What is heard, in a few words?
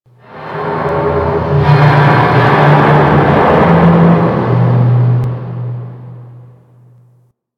Ocean Low Creak; Warframe; Grineer Ocean